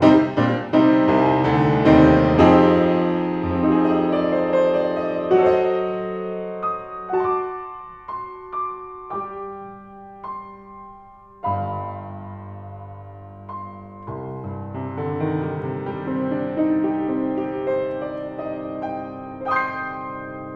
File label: Piano Location Firing At Wall